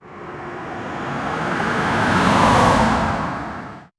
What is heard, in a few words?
taxi car passing by